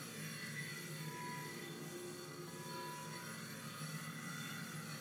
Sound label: Mushroom Lamp